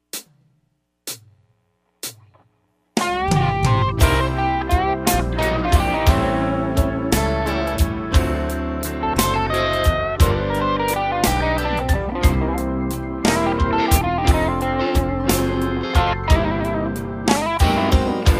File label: Slow Blues